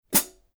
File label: color sound 900 15 purple heavy hi-hat chick, paiste